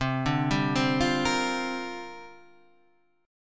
C 7th Suspended 2nd Suspended 4th C down

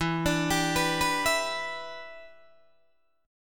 E Minor 7th, down, Guitar, DADGAD; Em7; arpeggiated

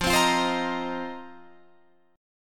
F 7th Suspended 4th F down Guitar Open F; strummed

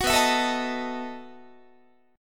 B 11th Guitar; strummed

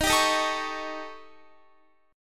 Eb 7th Flat 5th, Eb, Guitar; strummed; x,18,19,20,21,21

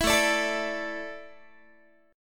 A Suspended 4th, Guitar; Asus4; strummed; 19,17,17,19,18,x

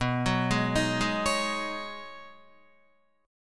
B 6th Add 9th B Guitar, chord; B6add9; arpeggiated

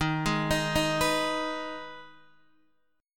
Eb Suspended 4th Sharp 5th Eb Guitar Open D

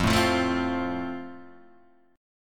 F Major, Guitar Drop D

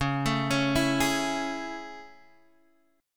A Major 7th Db

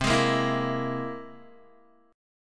C Augmented 7th, Guitar; strummed; 8,11,8,9,10,x; 4E,4A,5D,5G,5C,6F; chord; JGuitar

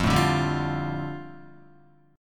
F 6th Add 9th F down Guitar, chord; F6add9 strummed

